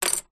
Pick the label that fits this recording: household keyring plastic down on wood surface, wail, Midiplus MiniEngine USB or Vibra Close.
household keyring plastic down on wood surface